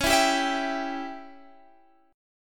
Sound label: Db Diminished Db down Guitar Standard; strummed; JGuitar